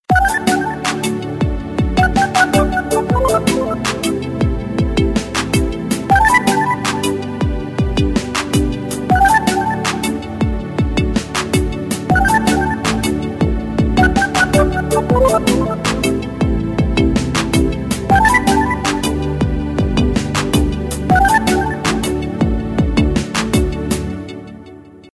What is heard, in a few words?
galaxy melody